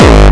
bomb; Jump Zampoli

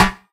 pickup spade; GoldMinerJoe

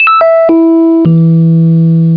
Elec Piano; DeluxeMusic2

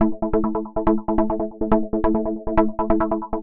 TECHNO; Techno eJay 4 Samples

